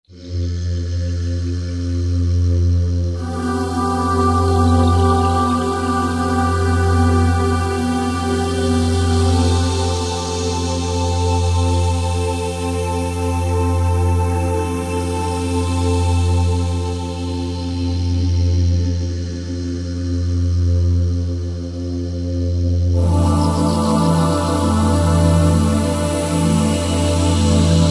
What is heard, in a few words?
Vocal Motion, Korg TR-Rack